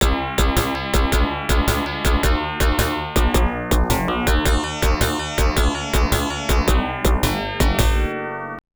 Dullard (Continue); Zero Wing; Toaplan; gamerip arcade

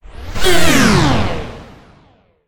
Heat Beam Start

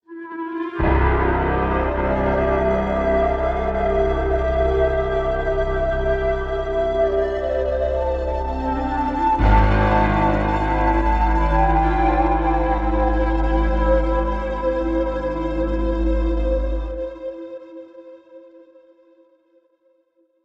boss intro intensity head 172bpm; chicory a colorful tale; ps4

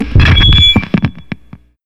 Static Noise Microphone Drop

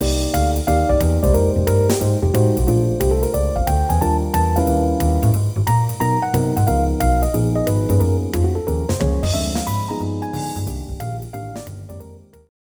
Sliding Bye; Side Pocket 3

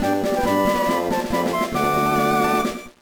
Fanfare TM & HM Get; Pokemon LeafGreen; gba

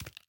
Footstep Run; Devour

